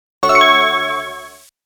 Credits (Coin); Psikyo Arcade Sound Digital Collection Vol. 02

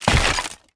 Wood Box Brake

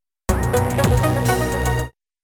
Tone; Metroid Prime Pinball

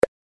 Button Tap

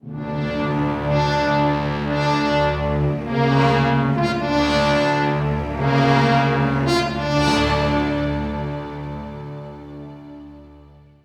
Horde Defeat; Endor

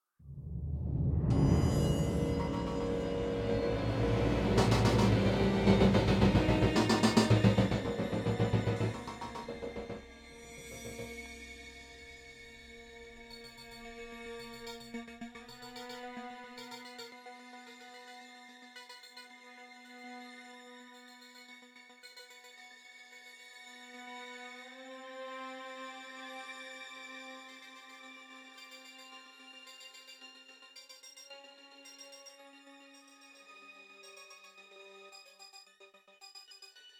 Open Gate; Remember Me

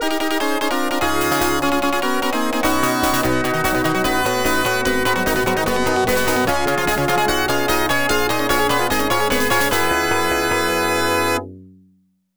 Match Start; super volleyball; genesis

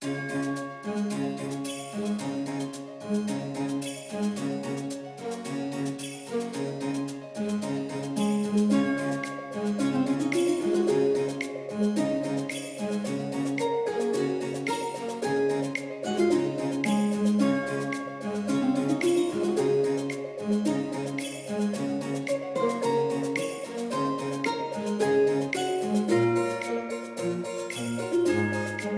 Styling BGM

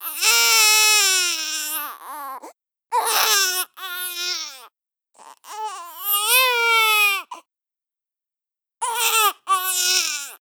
BABY CRY LOOP; CLOWNHOUSE; Don't Be Afraid The First Toy

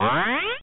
Credit SFX; super x mitchell version; arcade

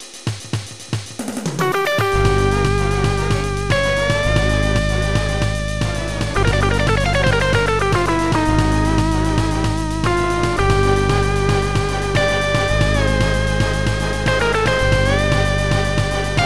Disquieting Atmosphere; Slayers SNES

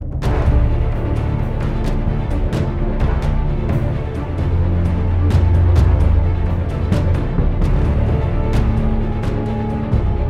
Loop Fight

